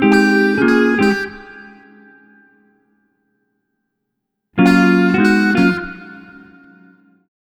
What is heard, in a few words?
Multi Guitar Bossa Gtr-G#, 105; Zero-G Kocktail Kollection; Construction Kitz (Lounge)